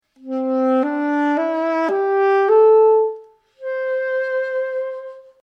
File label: C Pentatonic